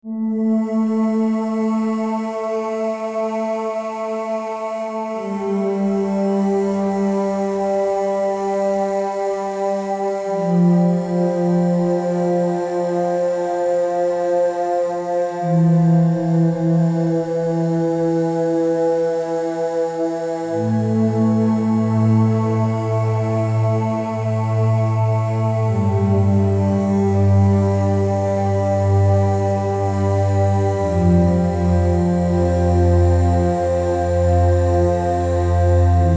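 Atmosfernye Zvuki Moria Dlia Sna, atmospheric sea sounds for sleep; Muzyka Dlia Sna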